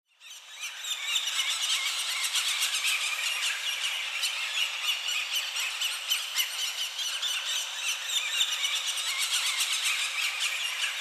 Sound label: Bird Ambience - Heavy Close And Distant European Birds; Hollywood Edge; sound designer's toolkit v2